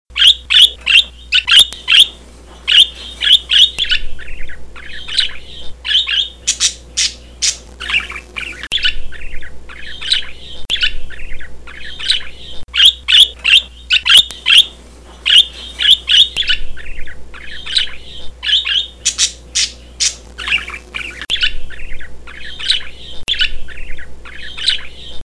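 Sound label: volnistyj popugaj golos, budgerigar voice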